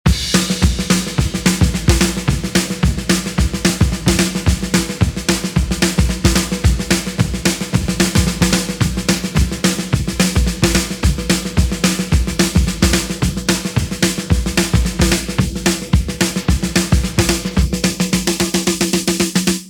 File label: pattern, drums